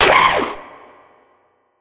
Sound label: cry